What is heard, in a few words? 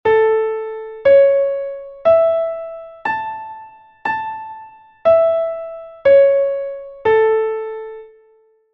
three tonic triad; Essentials of Music Theory: Elementary